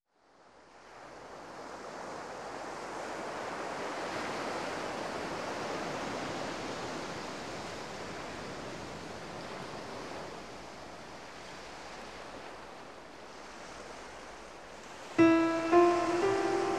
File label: ocean suite